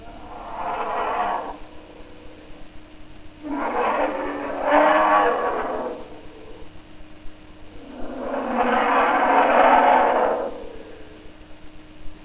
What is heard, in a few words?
Grizzly; Grizzly windows exit